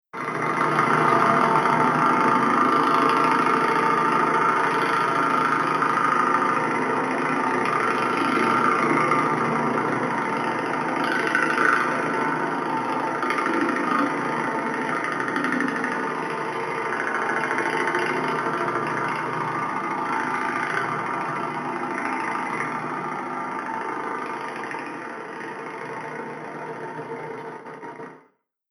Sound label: Strange Impacts Drilling; Miscellaneous Impact Sound Effects; Impact / Explosion